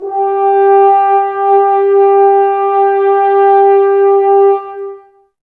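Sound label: Brass French Horns, Woodwind Brass Ensemble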